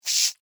Insect Hiss Angry, Animal, Insect, Hiss, Angry, Bug; Insect Voice